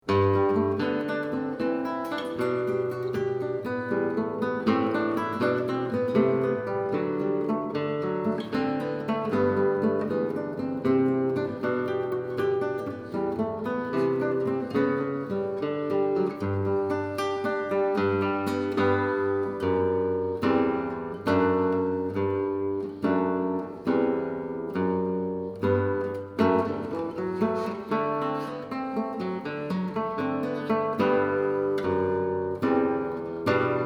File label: guitar; flamenco guitar; Renaissance guitar